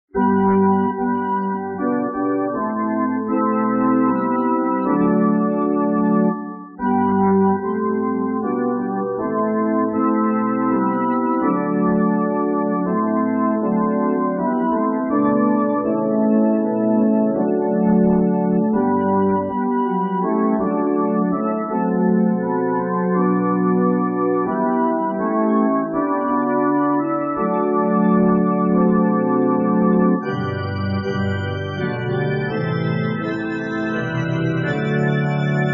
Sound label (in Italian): solo musica